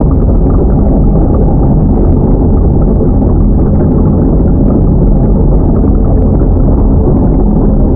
maelstrom bact big, ambience

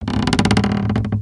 Platform Fall Start; ninjago

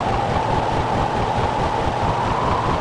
Fan

